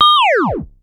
Sci fi

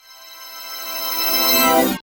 ORGANOUS, Synthy Tonal; Distored Reality

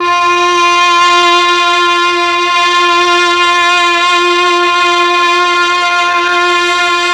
MEL STR, Legendary M400; ILIO; Propeller Island

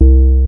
HEFTY C4, bass; Q Up Arts; Dream Experience

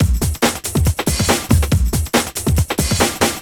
Break Beats, 140 BPM

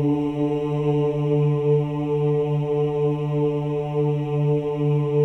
CHOIR OOS, D3; Spectrasonics; Symphony of Voices

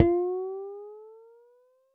U220 FX Set, Drum Machines; Roland U220

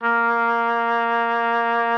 Oboe, A#3; Xsample VOL-6

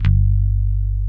G1, Steinberger; InVision Interactive; Lightware VOL-1; Instruments & Percussions

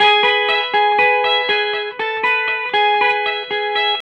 BYRDY RIF 1 E, Vintage Blues Guitar, 120; USB Soundscan vol.22; AKAI

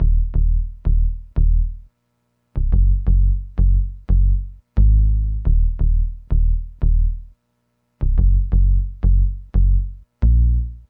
Hip Hop and R&B Drumloops

